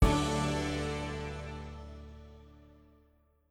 wand Out

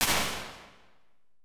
Perc Room; Medium Spaces; Apple

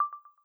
speech recognition did begin; MagnifierSupport

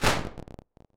Window Room, impulse responses, warped reverb; Small Spaces; Apple

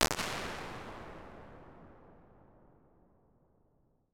Central Station, Impulse Responses, Indoor Spaces; Large Spaces; Apple

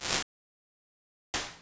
Reverse Slap; Medium Spaces; Apple

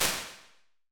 Bigger Bathroom, Impulse Responses; Small Spaces; Indoor Spaces; Apple

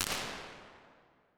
Sax Reverb, Impulse Responses; Medium Spaces; Apple

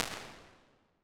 1.2s Small Staircase, Impulse Responses; Medium Spaces; Indoor Spaces; Apple

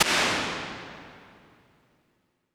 Spring Reverb, impulse responses; Medium Spaces; Apple

